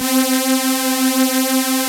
RESO GRILLE; Pro-Rec - Synthsonic

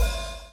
BLOW; Spectrasonics - Backbeat

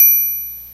blips, opl3

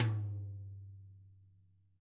DW, Double Platinum Drums; ILIO; Double Platinum Drums 1

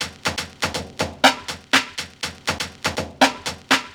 Filter Loop, Drums & Percussion, Electronica; Sonic Foundry (Sony Creative Software) - Electronic Point Blank

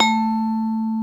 A2 vibe, vibraphone; InVision Interactive; Lightware VOL-1; Instruments & Percussions

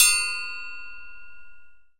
Rebar Open; Multisamples; Digital Sound Factory

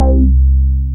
BAS MINI, Mini-Moog

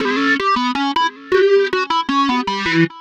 Funky Riff, Keyboards

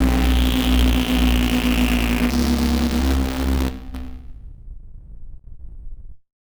random synth failure, malfunctioning synth

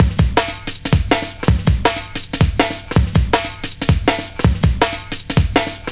Exclusive 162, Jungle Straight Loops; Zero-G Jungle Warfare 1, 2 & 3

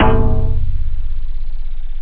pan bass; jazz jackrabbit 2; M8

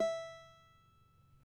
CAVAQUINHO E, Guitars

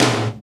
TOM TOM, Real

